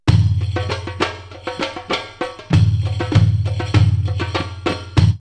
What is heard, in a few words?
Sandy Saudi 98, drumloops World Ethnic; X-Static Goldmine